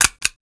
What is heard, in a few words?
Stapler; Office Supplies; 1001 Sound Effects